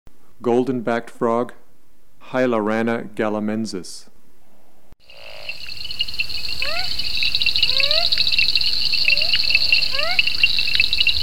Amnirana galamensis

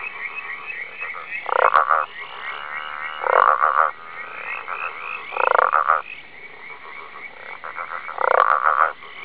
Litoria raniformis

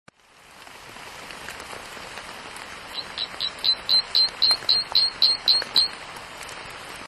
Boophis pyrrhus

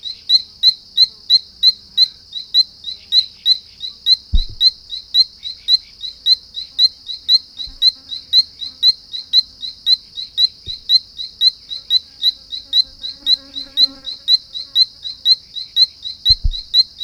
Ameerega berohoka

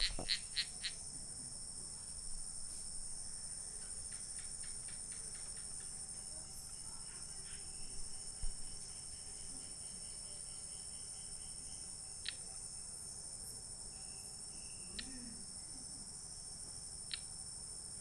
Phrynoglossus laevis

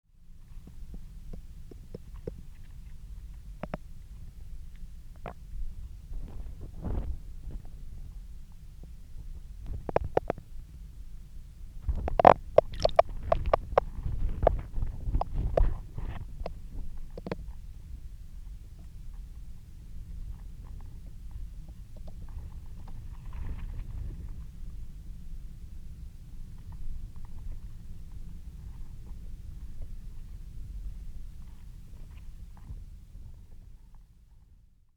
Rana luteiventris

